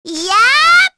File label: Vox Attack, kr; voices; heroes; Hanus; kingsraid